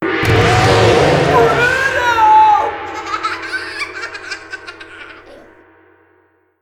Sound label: Brutal plus Giggling; Running Man Jungle; genki